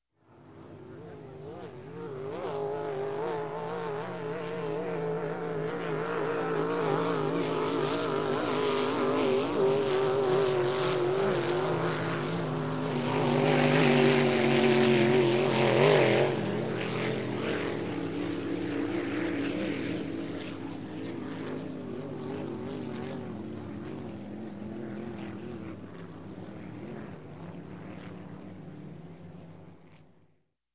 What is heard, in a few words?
Boat, Race Two Boats Race By, Marine; Sound Ideas; Series 2000